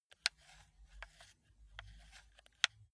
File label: console controller joystick move sequence